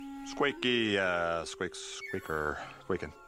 squeaker; emperors new groove

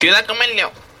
eeeec

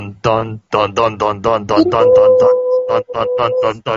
jaws music

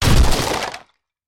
crate break; slime rancher

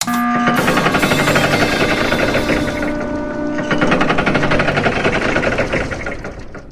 medal pipe

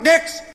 hitsound

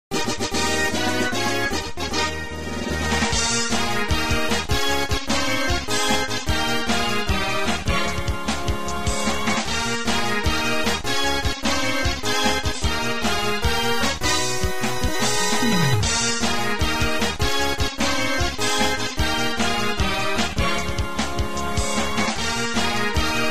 victory fanfare

